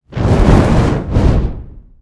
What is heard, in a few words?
conflagration